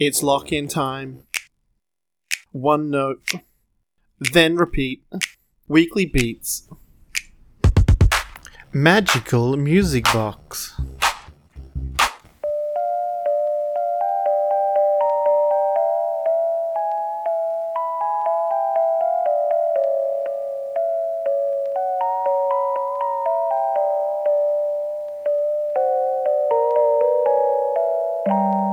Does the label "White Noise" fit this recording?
No